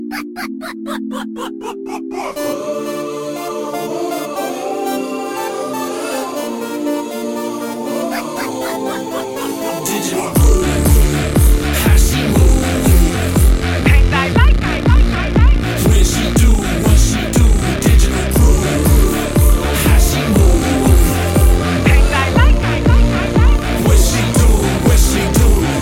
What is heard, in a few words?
Digital Groove